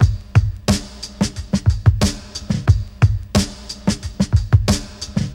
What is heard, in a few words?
90 bpm drum beat G sharp key; drum loops